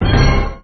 orch hit

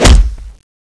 hit

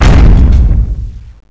boss footstep, zombi

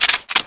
knife reload

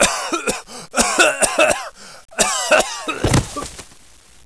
cough, downed

